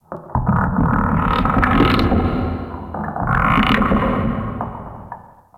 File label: Tentacle Creak; Warframe; Orokin